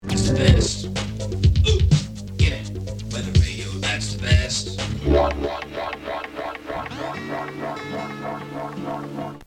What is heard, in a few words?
jingle; radio one